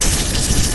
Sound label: Gun Smith Forge